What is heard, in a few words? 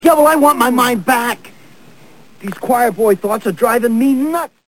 Choir Boy